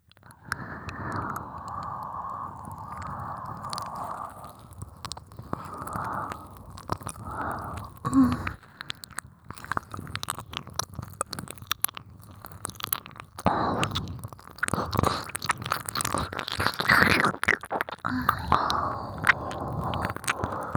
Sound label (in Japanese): 耳舐めB(ミドル・従順), ASMR; 清楚ビッチエルフ姫のメガ盛りマントロフルコース